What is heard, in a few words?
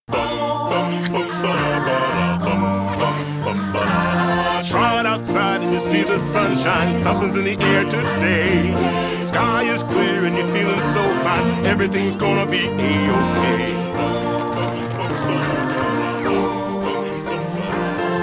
Relaxing piano electronic